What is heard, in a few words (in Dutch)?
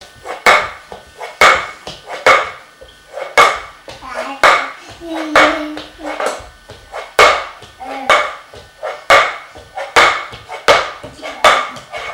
kruipen met blokje